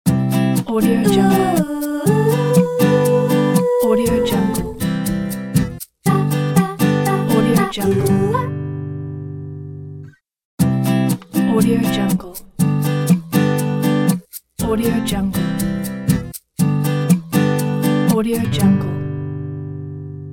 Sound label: melodical ident